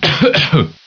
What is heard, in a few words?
map end; njam